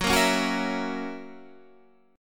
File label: Gb 7th Gb, chord